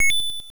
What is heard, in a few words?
pickup money; castlevania